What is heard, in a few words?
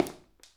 footstep